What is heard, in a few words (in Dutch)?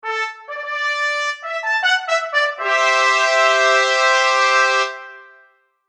Trompetgeschal